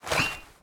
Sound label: Weapon Bottle Grab